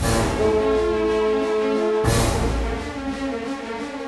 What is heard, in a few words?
Orchestral Loops; 128 MANHATTAN